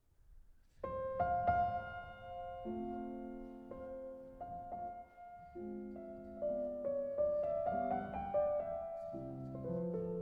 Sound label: Mazurka in C Major; Alexander Malofeev